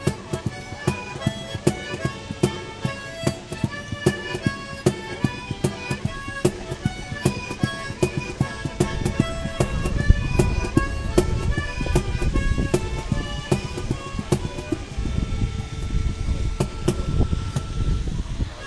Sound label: blue harp